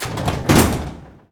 Door Metal Open; Doors

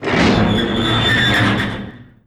Door Metal Flywheel Spin; Doors

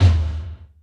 Menu Select

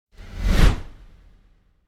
Whoosh By Classic Deep And Fast Passing; Hollywood Edge; sound designer's toolkit v2

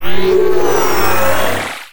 ship powering up; Space Wave Race

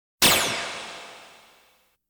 Science Fiction effects, Lasers, Light beams

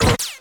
Menu Scifi Select; Menu FX

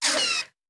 Bullet Pass By; Sound Ideas; Guns

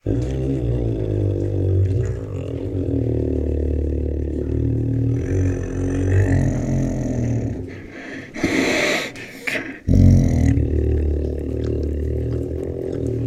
Many Deep Growls and Licks, Tigers; Sound Ideas

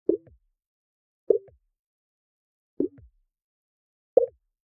Generic Click Digital

